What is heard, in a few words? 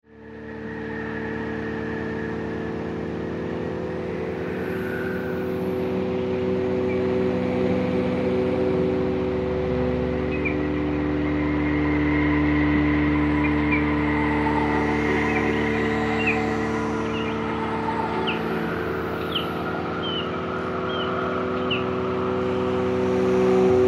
Angels Noise